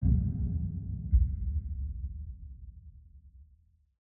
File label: designer wood boom; SoundsCrate